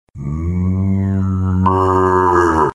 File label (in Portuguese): mugido boi; vinhetas efeitos sonoros